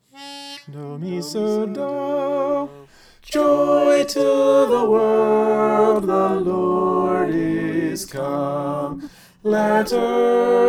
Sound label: soprano